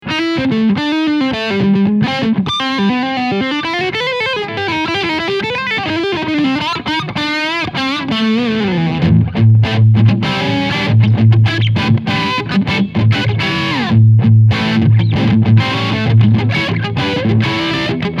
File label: Lick Riff